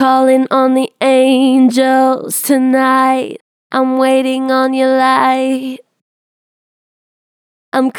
Str Vib Callin on the angels tonight, Vocals, Natural Vibrato Strong; Harmor Vocals